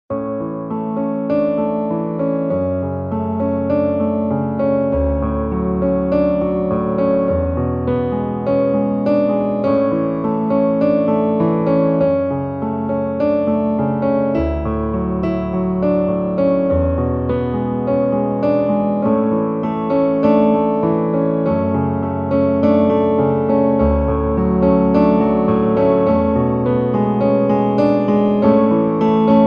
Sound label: Piano; Fantasia V